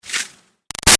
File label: Arrow By Ruffles Fast; Bow And Arrow Sound Effects; Bows and Arrows; Combat